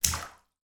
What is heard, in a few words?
household bath water splash shallow; sound effects one